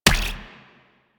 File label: Drone Death; Warframe; Weapons; Archwing; Powers; Archwing Healer